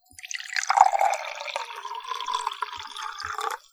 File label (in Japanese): mizu